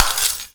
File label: ball buster break, weapons; tf2